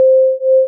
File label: Phase